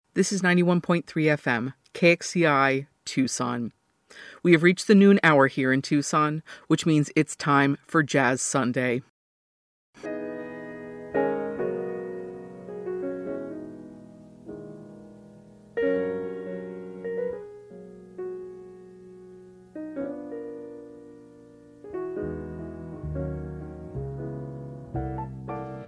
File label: jazz sundae intro